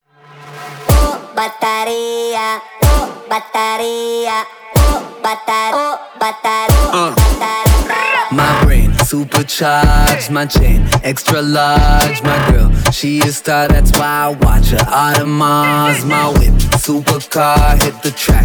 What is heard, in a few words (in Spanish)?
Bateria; BodyJam 102